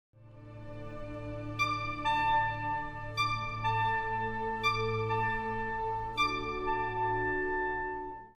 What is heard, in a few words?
Cuckoo; Chailly; Concertgebouw; mahler 1 1 wandering apprentice